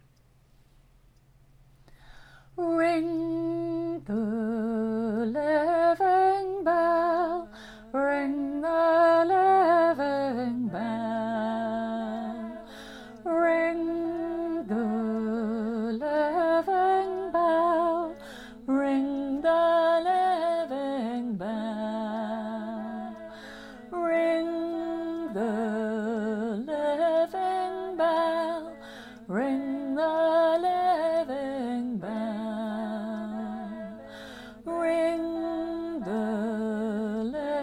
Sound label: Ring as 4 part round, community choir